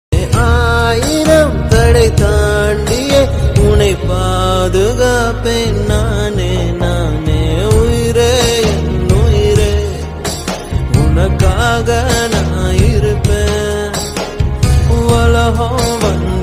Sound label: ringtone